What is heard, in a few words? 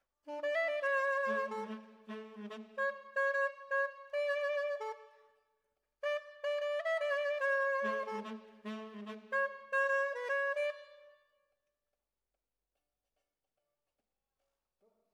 Brass